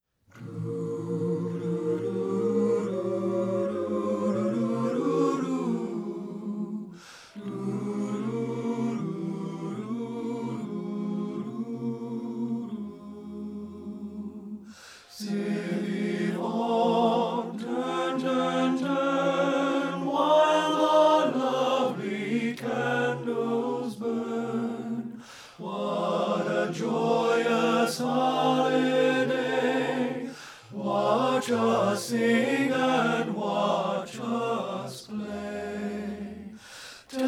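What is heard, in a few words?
SVIVON TENOR; satb; partbypart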